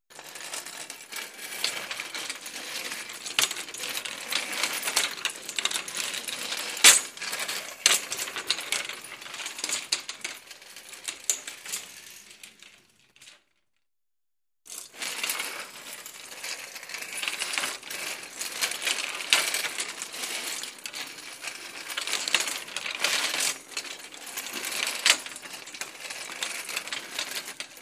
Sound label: Curtains open and close; windows